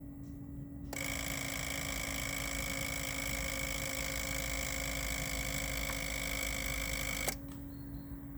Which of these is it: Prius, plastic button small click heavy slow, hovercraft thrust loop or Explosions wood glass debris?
Prius